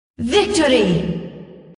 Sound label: victory